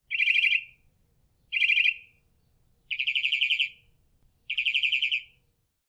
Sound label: squeek; matmos hl; wildlife